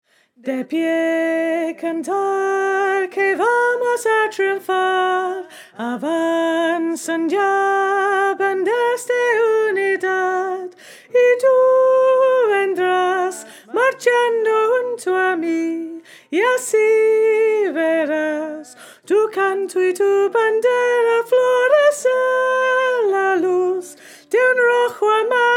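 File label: el pueblo soprano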